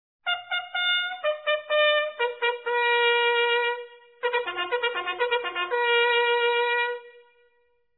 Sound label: Fire Alarm, bugle calls